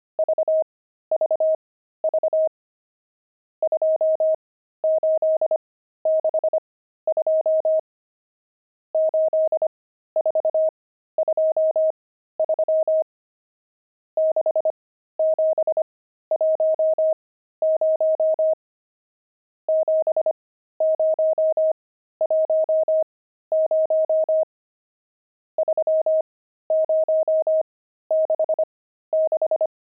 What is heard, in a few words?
pangram, intermediate pangrams files